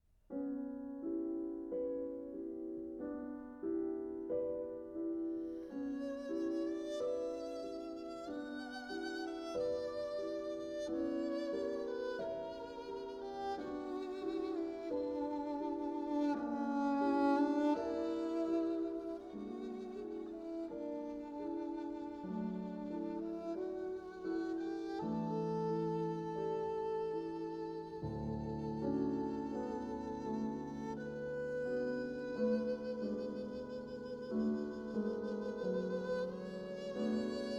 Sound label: Lullaby, violin and piano; Delius